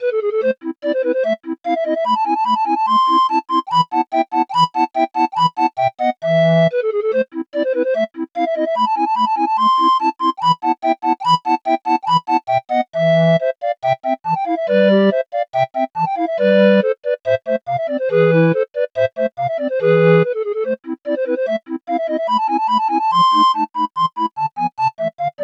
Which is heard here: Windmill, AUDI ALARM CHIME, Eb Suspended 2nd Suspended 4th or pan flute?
pan flute